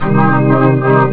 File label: organ min; jazz jackrabbit 2; M8